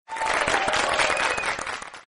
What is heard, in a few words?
correct; inlogic-zootrivia